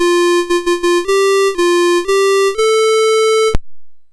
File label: Royal Intro, MOS6581R3, SoundEffects